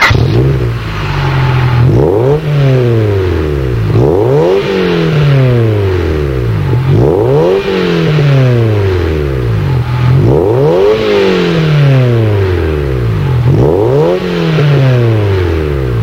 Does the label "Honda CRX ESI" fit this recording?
Yes